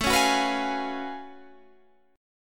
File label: F sharp Minor Major 11th A